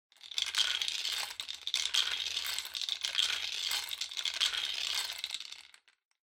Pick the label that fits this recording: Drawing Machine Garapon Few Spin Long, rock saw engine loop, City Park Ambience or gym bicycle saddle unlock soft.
Drawing Machine Garapon Few Spin Long